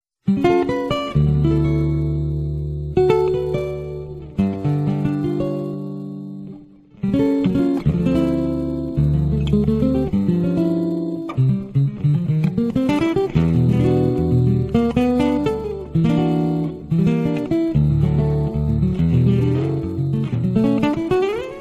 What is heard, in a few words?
instrumental